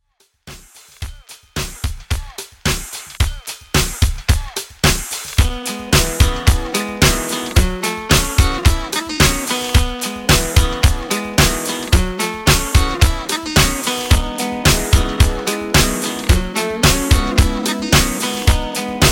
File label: Redrum 110Bpm